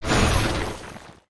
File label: Statue Transform